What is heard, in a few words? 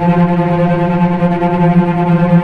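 cellos tremolo F3 piano right, string; East West - The Ultimate String Collection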